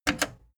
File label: plastic button slack click fast